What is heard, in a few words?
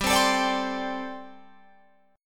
G Suspended 2nd Suspended 4th G, Guitar, Open F; Gsus2sus4 strummed